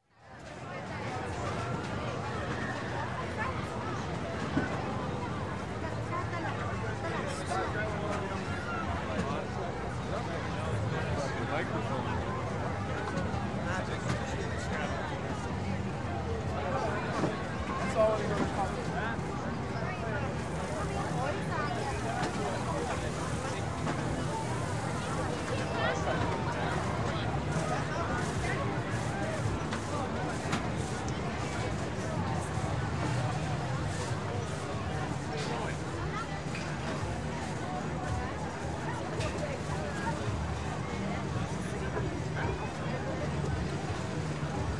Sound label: Fair, Amusement Park Midway Ambience Crowd; Games, Rides; Sound Ideas 2000 Series